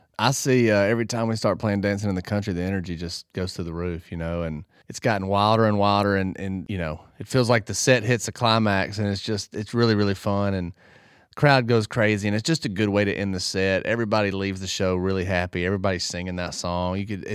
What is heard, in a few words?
crowd reaction